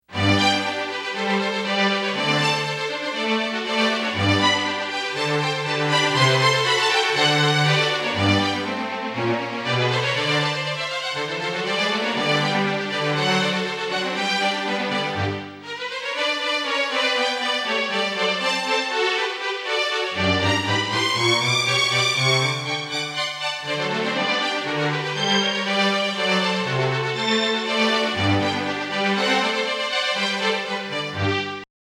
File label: quartet Ringtone